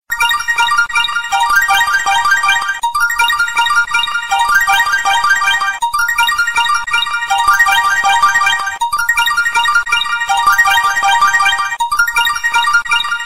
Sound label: iphone tone ringtone